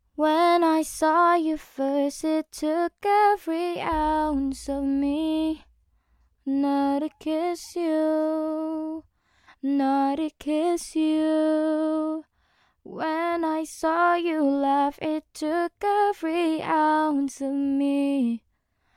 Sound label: pop 77 Bbm